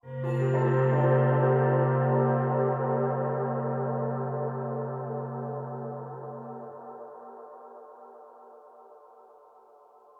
BGM Lobby; metal mind; xbox series xs

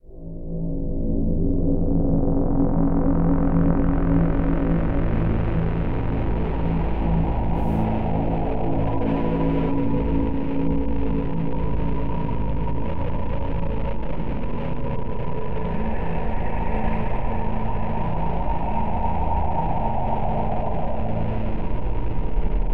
Music, Ambient Tension

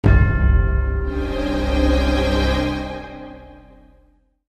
diplomatic ver bad

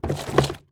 Ad box wobble; Talking Tom Gold Run

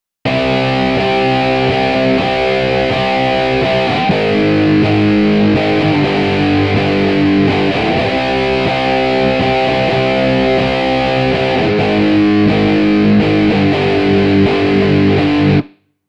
Guitar Dub

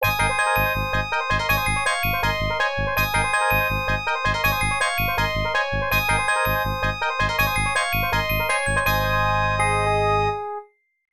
Round Clear

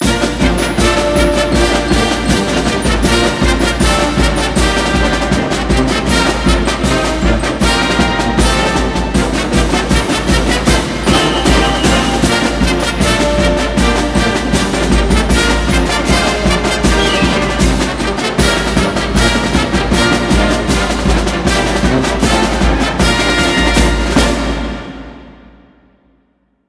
Broadcast Fight Song; NCAA Basketball 09; PS2, PS3, Xbox 360

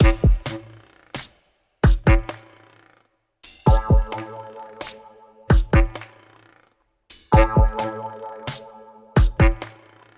Prison Loopable; Unspottable; Xbox Series X|S

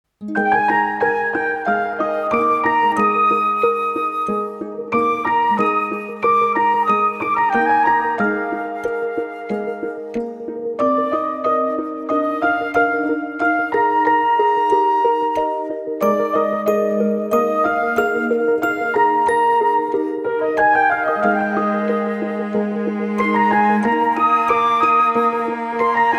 Leaf Flute Afternoon; Volzerk Monsters and Lands Unknown

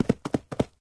Bull Attack

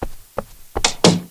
Patient Leaving

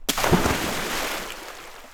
Splash Lg; Levels Hub Swamp; Cursor Potion

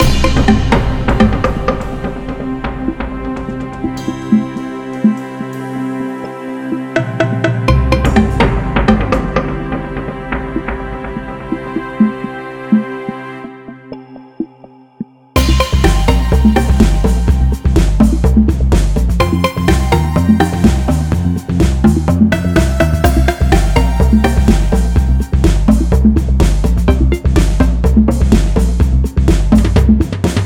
Pig Temple Battle; Voidigo

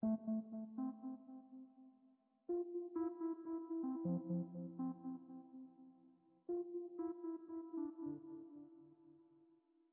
Stingers Piano; Plumksync

